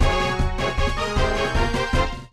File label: Short Attack